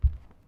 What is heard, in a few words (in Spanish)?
Paso descalzo alfombra; Yesterday Origins